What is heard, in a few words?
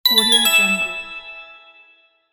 positive bright effect